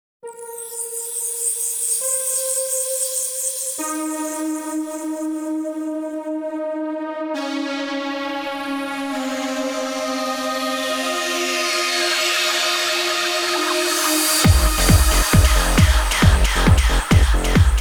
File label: White Noise